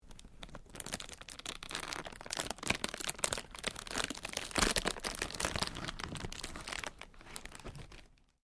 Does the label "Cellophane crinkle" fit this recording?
Yes